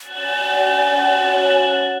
Airy (Press Keys), Tree Tone Presets; Ableton; Factory Packs; Inspired by Nature by Dillon Bastan

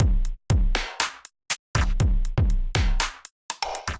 Kit, Drums Hybrid; Undercurrent Kit; Ableton; Factory Packs; Drum Essentials

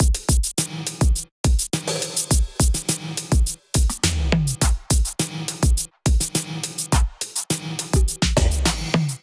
Kit groove 104 bpm; MIDI Clips; Momento Kit groove; Drive and Glow; Ableton; Factory Packs

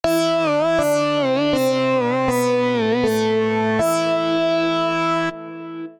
Stompbox Pluck A Minor 80 bpm; MIDI Clips; Tonal; Melodic; Ableton; Factory Packs; Chop and Swing

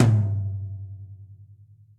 Tom Low Direct Natural; Drums; Drum Hits; Ableton; Session Drums Studio; MPE

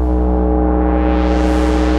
Rumble Big; Vector FM Presets

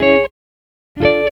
090 E-Coast HipHop Guitar Chords Dm

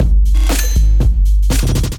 Dreams Kit, Drums; Ableton Factory Packs; Beat Tools

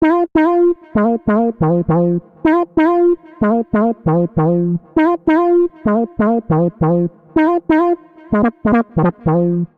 Bb Minor 89bpm; MIDI Clips; Melodic; Evening Casual; Ableton; Factory Packs; Chop and Swing

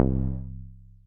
Tube Bass, collision; Ableton; Factory Packs; Synth Essentials

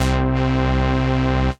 Live Bass

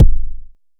analog quick, kick; Ableton Foundation FreePacks

